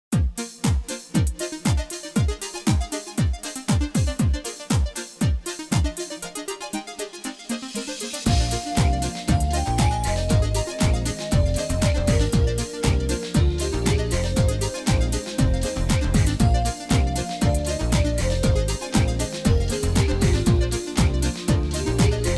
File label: loop